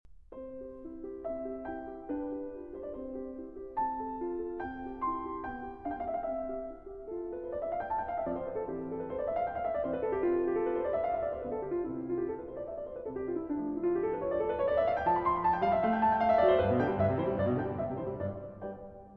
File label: Piano Sonata No. 16 in C Major, K. 545 Sonata Facile I. Allegro; Mozart